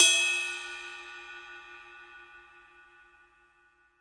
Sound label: CYM RIDE, DRUMS SINGLE HITS